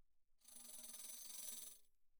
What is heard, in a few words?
tambourine thumb roll pp; Percussion